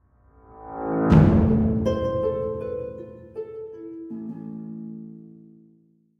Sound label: ambiance defeat